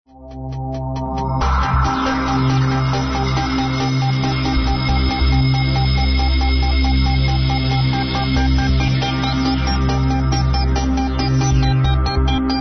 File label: Trance